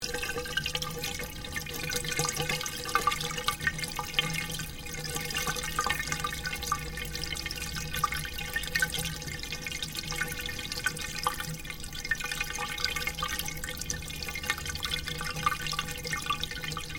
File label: Water Bath Spa Overflow Loop; Domestic water movement; Nature